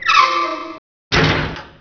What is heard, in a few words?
DOOR; Hidden & Dangerous; h&d demo